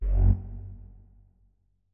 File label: flute notes; Hideout Furniture